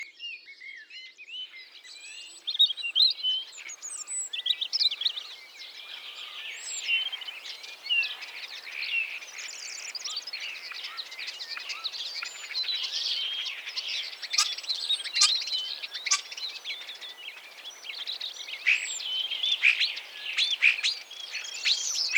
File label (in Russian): zvuki prirodi skvorets